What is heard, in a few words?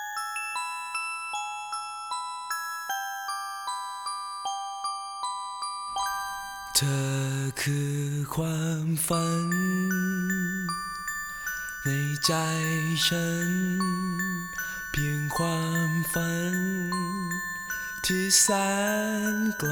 MUSIC BOX VERSION; Alternative